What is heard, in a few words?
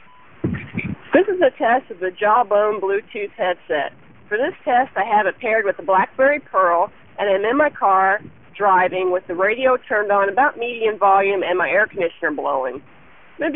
drive; jawbone